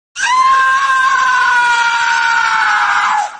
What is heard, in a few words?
Falling Scream